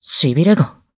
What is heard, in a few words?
Taking Damage (Lightning); BBCF; Hibiki Kohaku